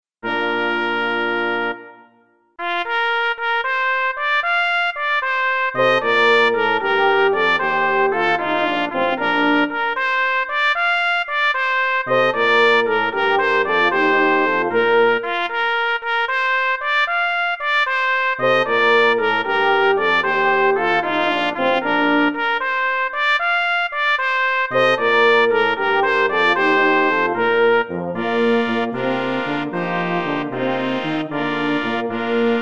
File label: I Saw Three Ships (chord + 6vv)